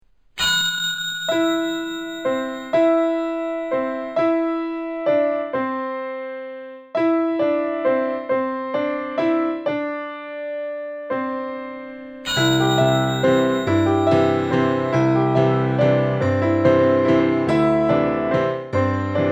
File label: Waltz